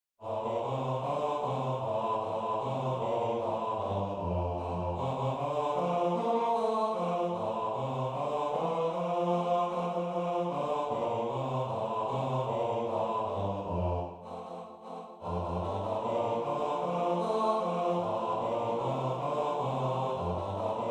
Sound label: bass